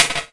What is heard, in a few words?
Sark, One Shots Hit; Sonic Foundry (Sony Creative Software) - Future Retro Dance Excursions